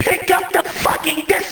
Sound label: disc